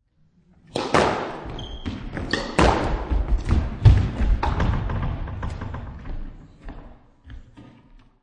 Sports Squash Short Rally Serve Return; Racquetball

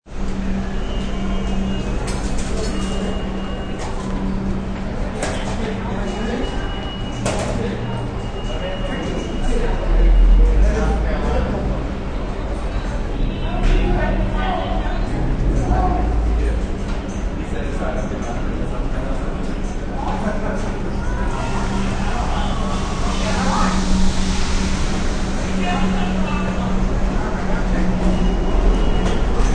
Inside NY Subway, Sounds of a subway station; Train Stations

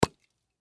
Hairspray Can Lid Pop Off; Hair Salon Barber Household; Primping; Foley; Blastwave

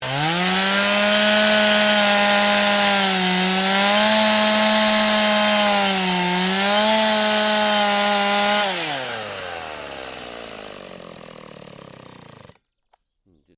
Chainsaw: petrol chainsaw cutting at high revs; Machinery; Stuart Duffield

